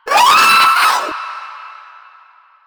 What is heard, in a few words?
Proto Infested Runner Vocal Short Attack, Enemies; Warframe